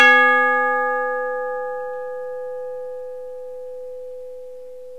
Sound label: VOICE & BELL